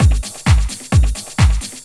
130 tribal, LOOP PERC TRIBAL

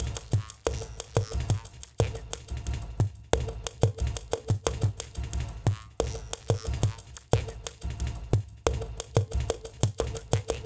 Glitched, Processed Percussion

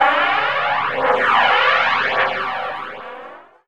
WING; Rarefaction; Poke in The Ear With A Sharp Stick

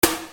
Snare Dr

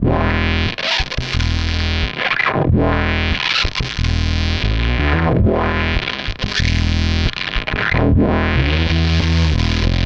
Distort Wah, Bass; Effected; Bass X

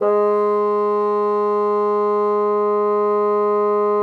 bassoon, G#3; Xsample VOL-6

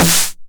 909 SD Tape Sat

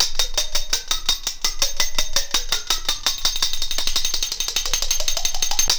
CHINA SPOON; Zero G - Ethnic; CHINA SPOONS

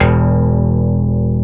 CP-80, Yamaha CP-80; Greytsounds VOL-1

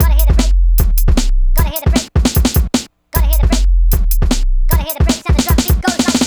drums, Hardcore Breakbeat, 153 Bmin; Big Fish Audio; Hardcore Breakbeat Action

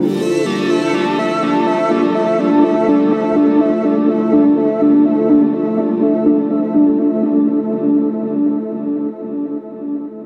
NRG Pad

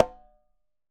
Congas Conga mute; Best Service; Festa Latina VOL-1; Single-Hits

